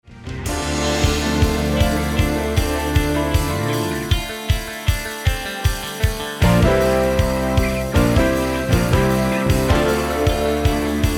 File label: Lead Guitar Solo Included Key Of F Minor